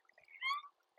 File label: Chirp